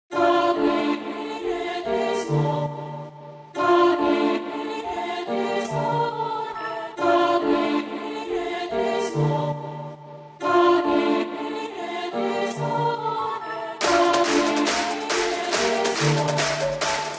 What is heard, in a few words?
subspace electro ringtone